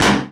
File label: Plasma Hit